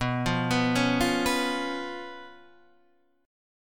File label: B Suspended 2nd Flat 5th B; Bsus2b5 Chord {7 8 9 6 6 7} arpeggiated